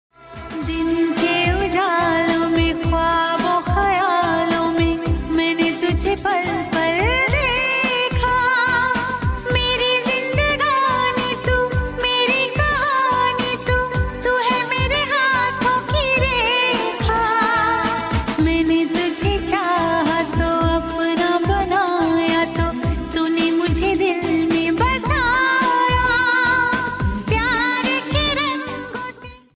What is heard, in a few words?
Love Ringtone, Hindi Ringtone